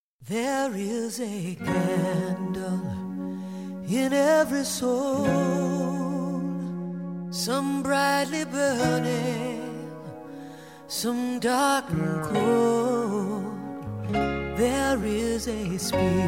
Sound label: Chorus